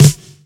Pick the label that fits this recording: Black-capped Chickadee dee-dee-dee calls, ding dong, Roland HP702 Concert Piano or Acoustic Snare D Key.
Acoustic Snare D Key